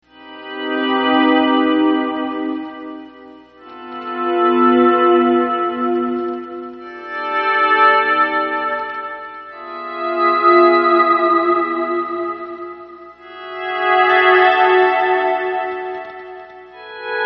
vol swell

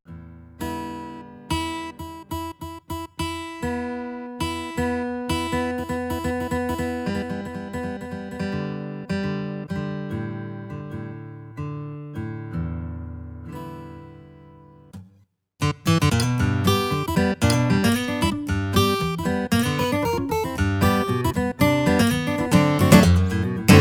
Steel Guitar

